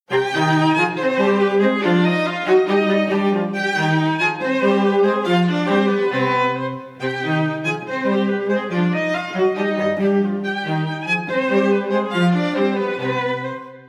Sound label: String Quartet in C Minor, Rondeau; Chevalier de Saint-George; Op. 1, No. 4, Mov. II; card shark soundtrack 2022